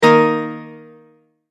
Multimedia Tone-Stop

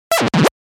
Beep And Bleep Sequence

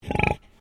Animal Pig Landrace Grunt; Alice; Edgar's Mission Farm Victoria; Pigs; Animals

